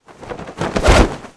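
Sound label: Condor attack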